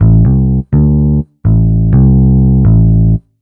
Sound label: DEEPHOUSE BASS 125 A